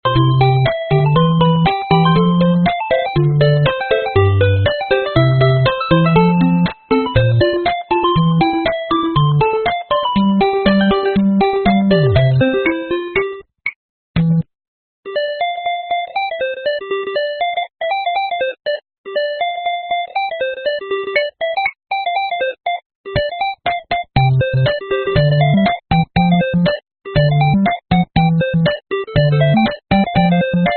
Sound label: music